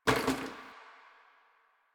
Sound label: Janitor Plastic Bottle Water Drop; Warframe; Ambience; Nineteen Ninety Nine